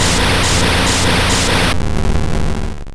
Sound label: Blizzard; Pokemon; Gen 1; attack moves; RBY